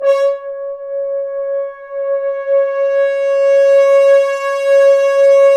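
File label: BRS, French Horns Dry f; Roland L-CDX-03 Disk 2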